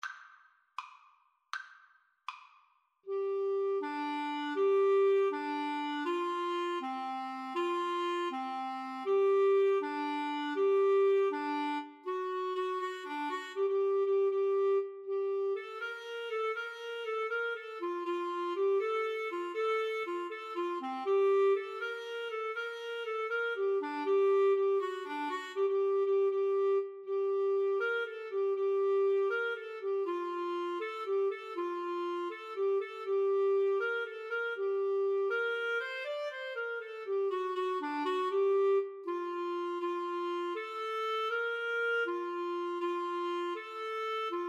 Clarinet Duet

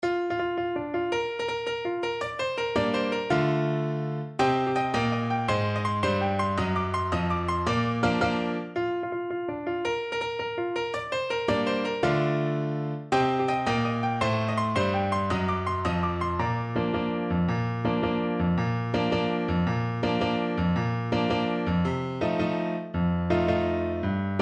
French Horn; Ganne, Louis - Marche Lorraine

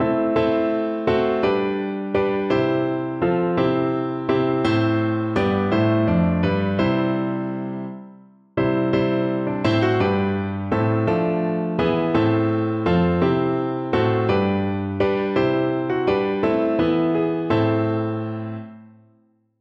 puer natus, piano

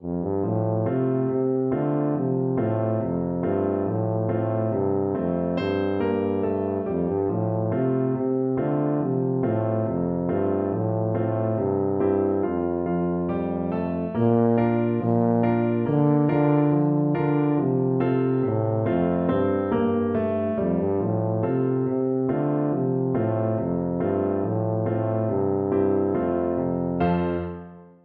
alabama, tuba

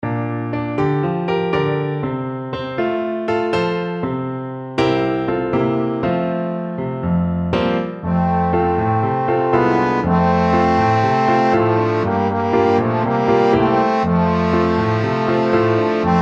trombone duet

